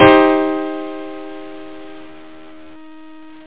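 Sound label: Grand Piano Min4, Chords; AlgoMusic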